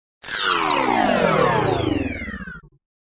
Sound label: Descend High Frequency Pulsating Electronic Descend with Shimmer